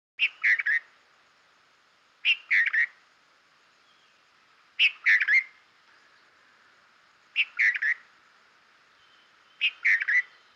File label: Bulbul, bird call